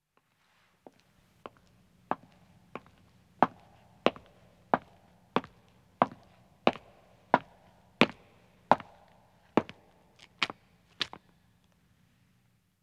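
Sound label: Stone Walking, 1 Man Approaches, Footsteps; BBC Sound Effects Library; Footsteps II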